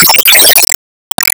Enemy Active Shot; Enemy Boss Common Strongattack Shot